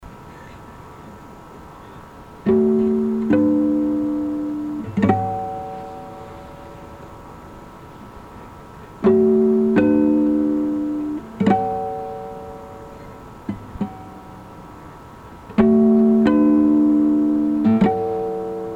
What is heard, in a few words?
Guitar